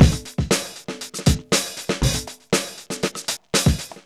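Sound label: 119 bpm, Funky loops; Zero-G; Funky Elements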